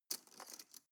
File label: cutlery teaspoon drawer grab soft